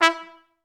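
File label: staccato E3; Best Service; Peter Siedlaczek's Advanced Orchestra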